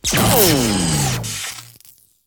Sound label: wp fail; Original TK Sounds